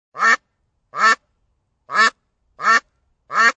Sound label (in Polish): kaczki